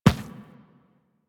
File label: Inf Medium Run Default, Infested Footsteps; Warframe